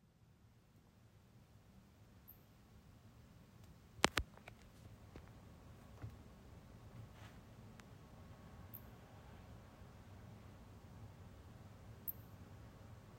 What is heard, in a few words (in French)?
animal bipeur bruit bizarre; récidive